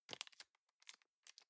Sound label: plastic bag small clasp open